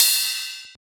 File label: Ride; FLPRO